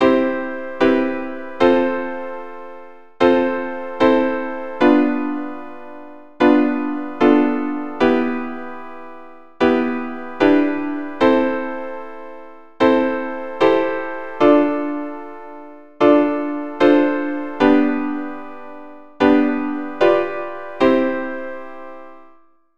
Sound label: Circle or 5ths Major Diatonic, keyboard